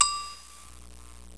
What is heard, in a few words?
GOGO; MUSIC; PERCUSSN